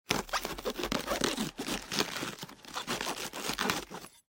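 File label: sounds of lettuce leaves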